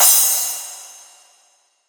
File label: 909 CYM, Tape 909; Goldbaby Tape808 & Tape909; The Tape 909